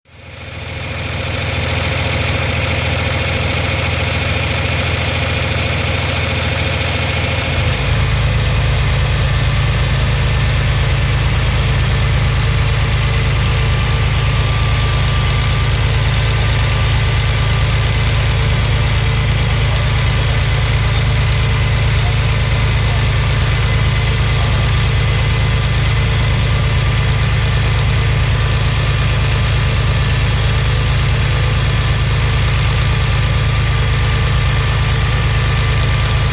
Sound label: tractor